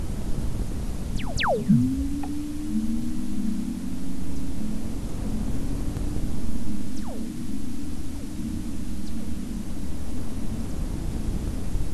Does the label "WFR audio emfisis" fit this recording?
Yes